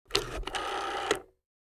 rotary phone

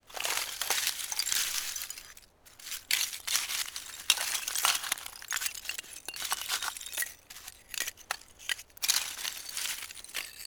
ice collecting; nature

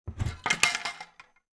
cabinet door open

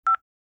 cell phone

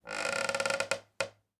wooden floor creaking; nature

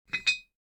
glass cork open; drinks

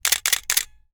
camera shutter click

